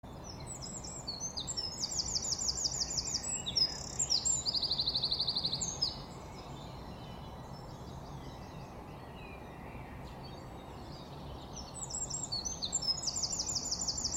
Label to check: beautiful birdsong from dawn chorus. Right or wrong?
Right